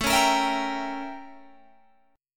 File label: A 7th Flat 9th A, Guitar Open G; 19,18,20,21,20,20